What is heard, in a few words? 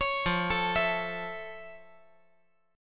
F Augmented Major 7th F